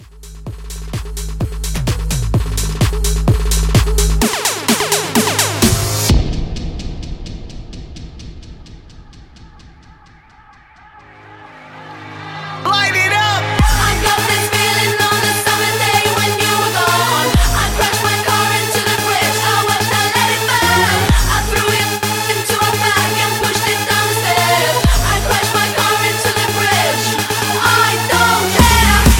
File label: Edm Mashup 128 CLEAN